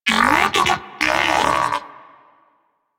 Moa Pet Battle End; Warframe; Pets; Tenacious

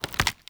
Foley Melee Swing; Characters; Enemy; Mockeries

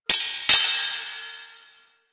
Sparks; Warframe; Quests; TheNewWar; Ambience; Zariman

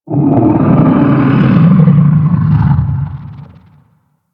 Warning Vocal; Ambience; Golden Maw; Warframe; The War Within

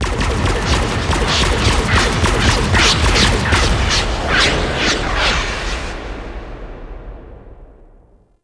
Ship Enter Torpedo Fire; Vala; Warframe; Quests; Wraith Quest

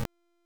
Boss Stomp Web Explosion; Warframe; Oraxia; Abilities

